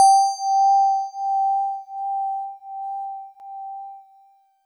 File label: DX100 G5; David Lynch